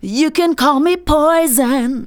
vocals sung; Techno Trance Essentials

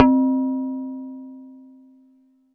Synth Bells; Dream Zone

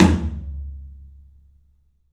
TOM, 3-D Percussives; E-MU Producer Series Vol. 5 – 3-D Audio Collection; P.A. Kit

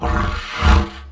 Didgeridoo Kit Samples; Sonic Foundry (Sony Creative Software); The Electronic Music Manuscript Collection; Battery 3 Sample Kits

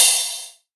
D&B Open Hat, Drum & Bass Kit; essential drumkit

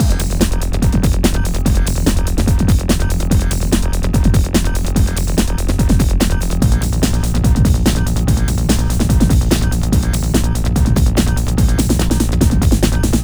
Decimater, Hardcore Breakbeat 145 Cmin; Big Fish Audio; Hardcore Breakbeat Action

